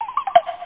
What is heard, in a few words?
next Hilight; Bible Fun Pack; Education Publishing Concepts